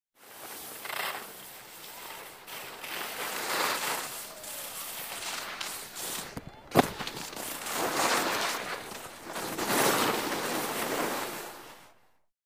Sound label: Downhill skiing; snow